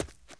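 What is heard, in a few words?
run left, dirt foot; The Elder Scrolls III - Morrowind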